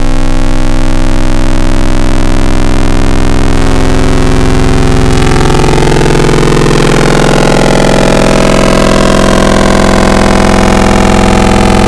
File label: Add Harmonics, Future House Wavetables; Cymatics; Serum